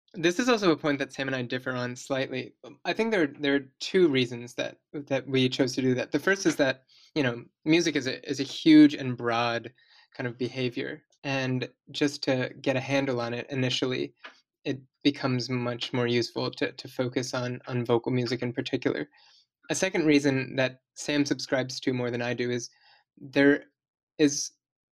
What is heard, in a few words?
vocal music only